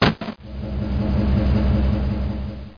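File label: elevator start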